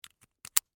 Mic Cable Connect, Technology, Mic, Cable, Connector, In, Click; Microphones; BlastWave